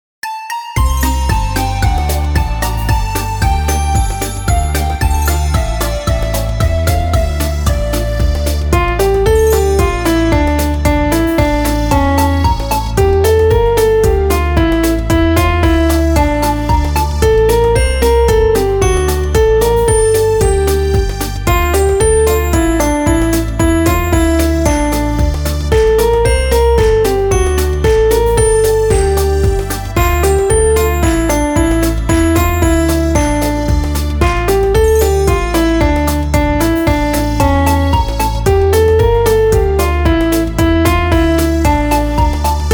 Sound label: karaoke